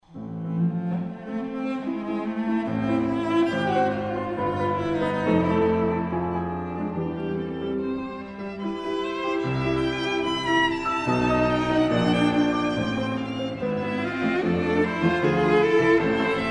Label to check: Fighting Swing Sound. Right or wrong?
Wrong